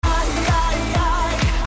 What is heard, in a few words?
AYAYA AYAYA; cstrike; sank sounds